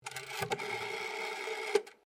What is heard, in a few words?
TELEPHONE OLD ROTARY BAKELITE DIAL